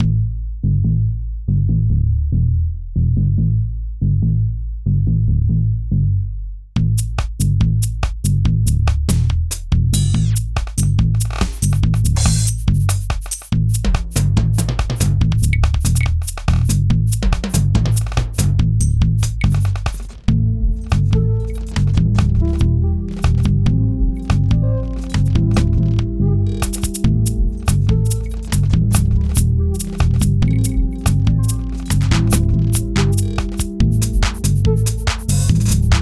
bass

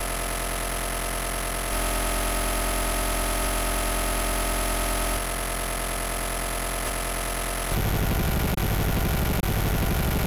Harsh Noise

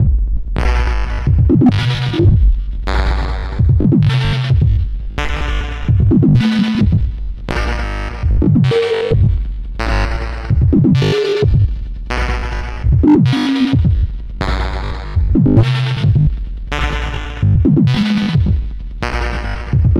strange beat

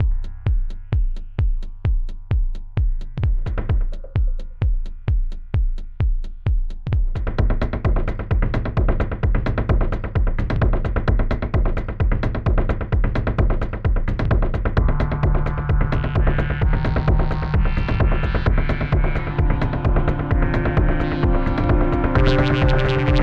heav beat

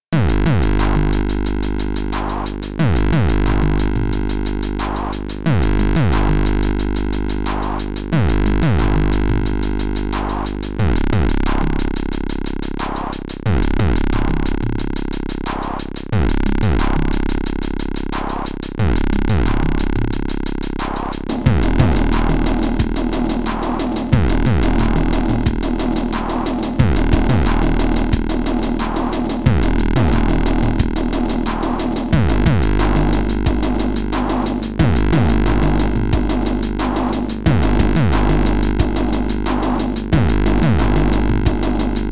beat

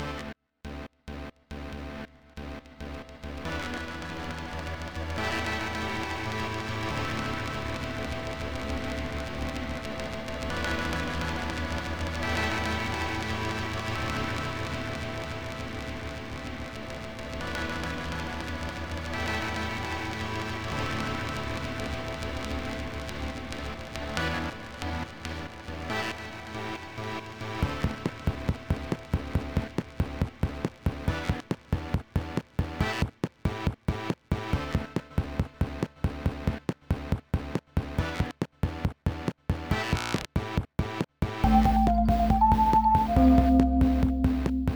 bell

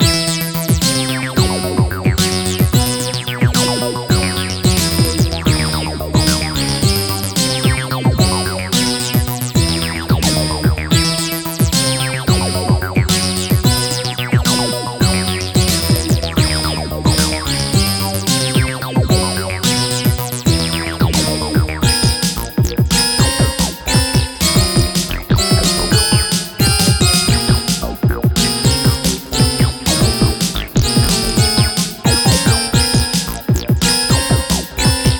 Beat No Sound

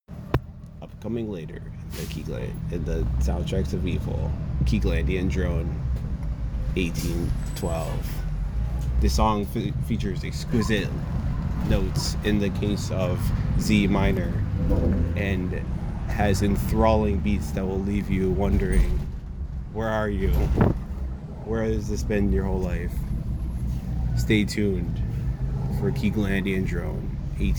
Drone; Keeglandian Drone 1812 Trailer

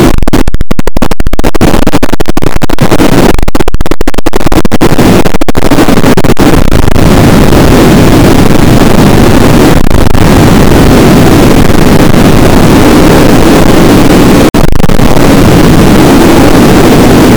alarm glock, harsh noises; harsh noises and gummies vol.1

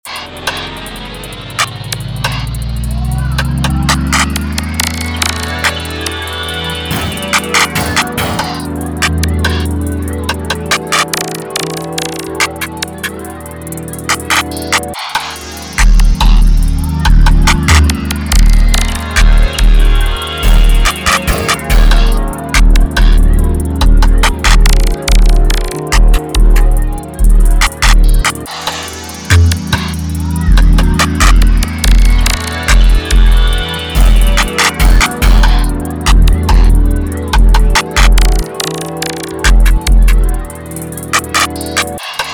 284 BPM

